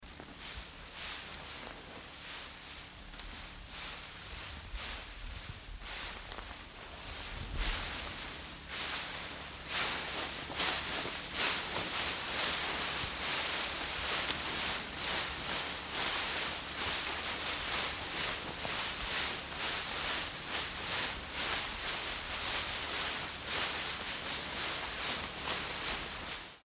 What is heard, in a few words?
going forward glass